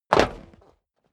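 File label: step boots metal dry jump, footsteps; schritte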